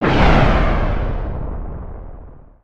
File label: distant blast off; nimbus station